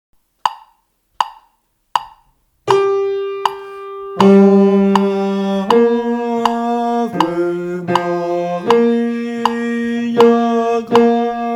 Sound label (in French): ave maria basse